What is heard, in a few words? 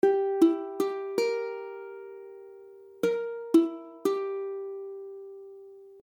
Edim, akordy ukulele